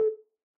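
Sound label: ocarina sustain A4 release; Aerophones; Edge-blown Aerophones; Releases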